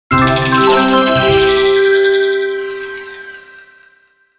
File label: Logo Twinkle Koto Descend; Sonic Logo; Blastwave